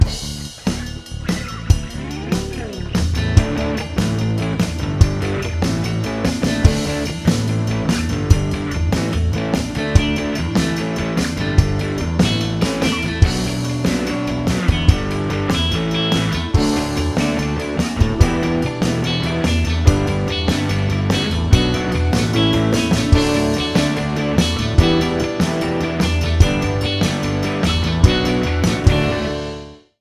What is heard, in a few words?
Rock Positive